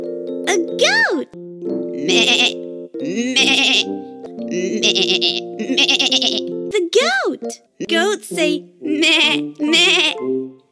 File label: goat